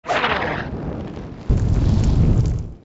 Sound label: hot air; Toontown Online